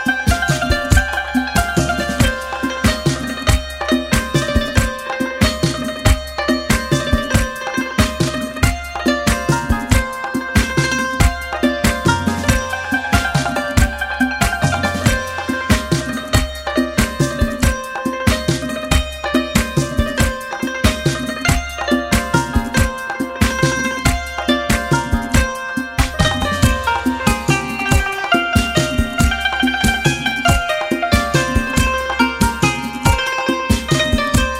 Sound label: Santur